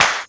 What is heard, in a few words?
old school hip hop snare sample a sharp key